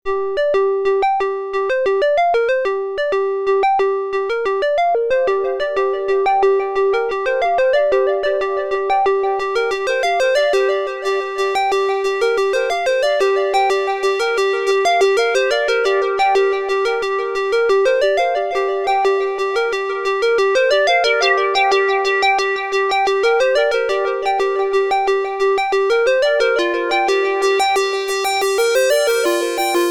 Triangle Lead; Implexus

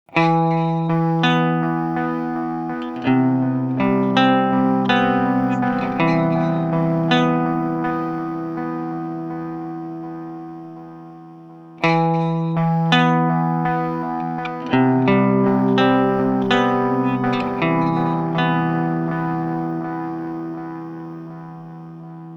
Boss ME 90 Direkt Diamond Analog Delay

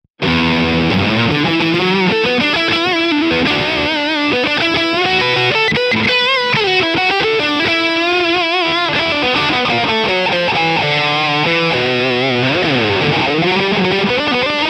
Lead High Gain; Archetype Tom Morello

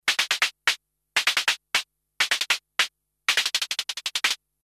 snare drum

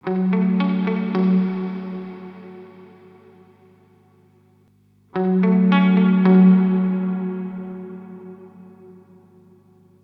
Earthquaker Devices Ledges Hall damping min max

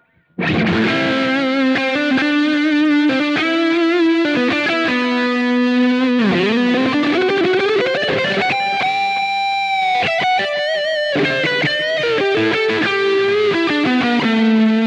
Schecter Lead Neck to Bridge Humbucker; Rob Scallon

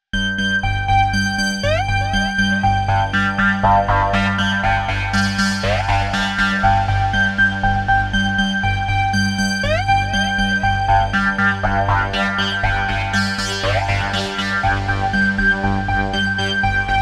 Oberheim OB 1 Arctic Arp; GForce Software

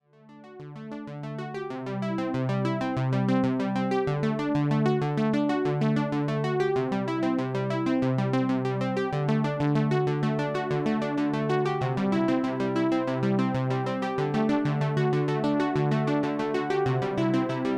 AMS dmx 15 80S Delay Sequence

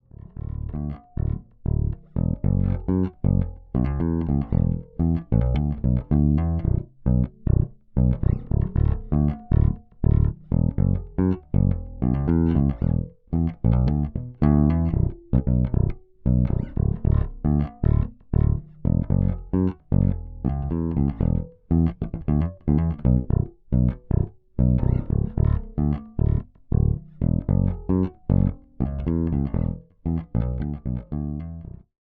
Radial JDI K Bass

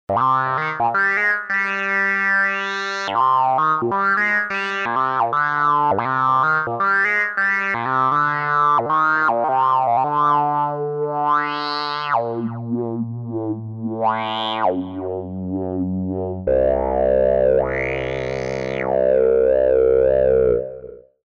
Kodamo Mask Vocal

